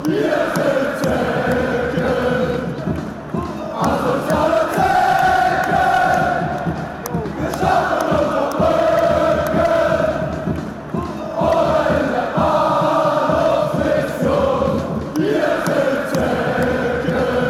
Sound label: ticks fanchants